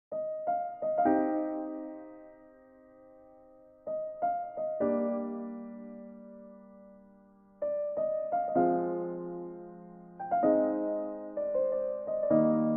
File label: Piano Karaoke Instrumental Acoustic Unplugged